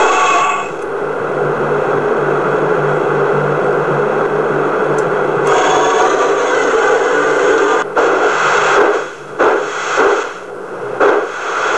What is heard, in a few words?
mallet